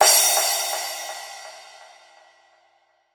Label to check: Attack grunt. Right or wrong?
Wrong